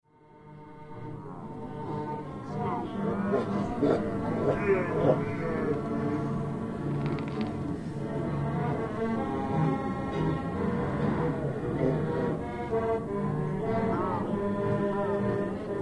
Accordion busker player, playing at tables with some crowd backgroun; Accordion busker player, playing at tables with some crowd background; Keyboards; Tom Hutchings